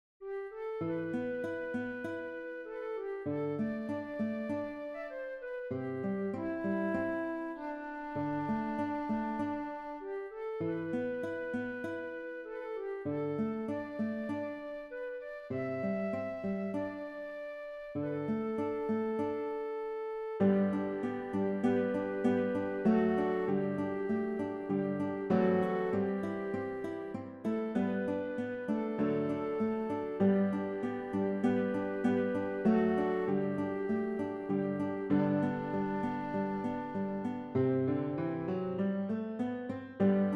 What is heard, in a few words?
flute guitare piano; Titanic